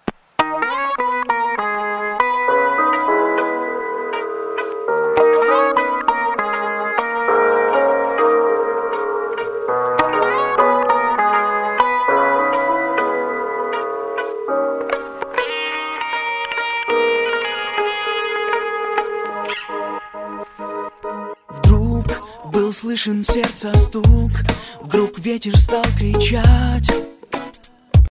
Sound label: Song